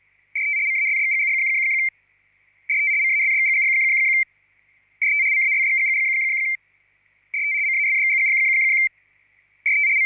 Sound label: GLOBE WIRELESS 100Bd FSK FREE CHANNEL MARKER